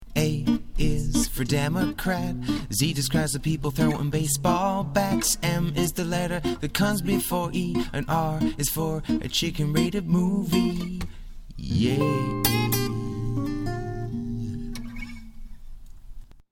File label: demented children's song